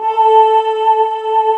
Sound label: RV CHOIR